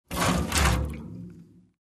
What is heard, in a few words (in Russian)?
zvuki kanistry